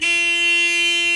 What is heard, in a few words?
Car Horn Loop; Automobiles